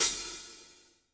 Hi-Hat Special; Sonic Foundry (Sony Creative Software); Vital Drums

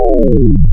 Spread Odd HMX, wavetables; Animoog

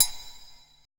E HITUBE, ETH PERC

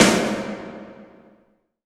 IMP SNR DRUM, Drum, Cinematic Impact

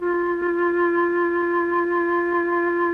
MLTRON FLT, MELLOTRN; Sonic Reality; Vintage Timetraveler

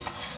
GUITAR FX; Xsample VOL-2

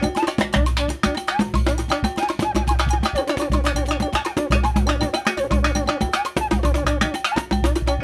RIO, Rio Batucada

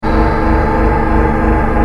Reactor, Ambience; Industrial

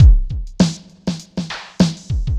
drloop, drumloops, Vinyl Attack; Masterbits

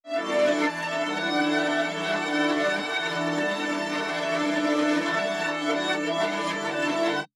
Granular Pad

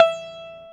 EL SITAR E4, ETHNIC; AKAI S-Series CD-ROM Sound Library VOL-8

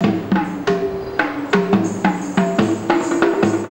PERC, India; Best Service; Ethnic Journey Sound Cube

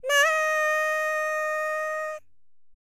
HIMALAYAN, World Voices; ILIO; Vocal Planet VOL-5

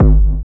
DR. LOOP, DRUMS; X-Static Goldmine 3

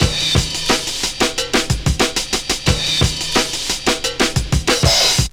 Funk Break, Drum & Bass; Fluid Dynamics

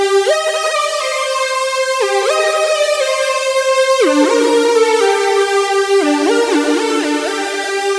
Fat Line, Funk; Dr. Fink's Funk Factory

